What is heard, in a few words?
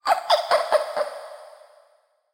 ghost laugh